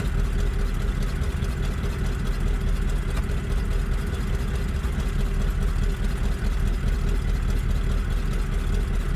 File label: engines; World of Tanks